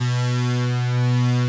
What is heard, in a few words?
FAT P-10, PROPHET 10; Greytsounds VOL-1